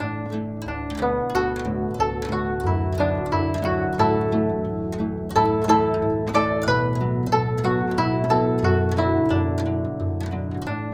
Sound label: GUCHIN 90 D; INIS - Opium